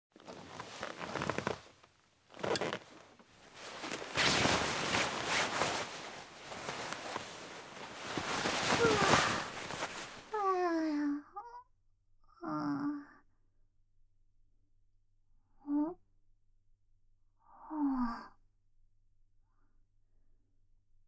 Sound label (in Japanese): 耳舐め ＆ 耳ふー